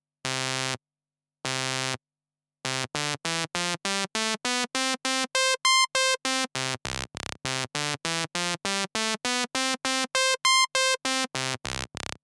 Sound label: Saw HPF, synthe